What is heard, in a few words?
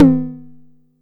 Bass Tom, Jomox Airbase99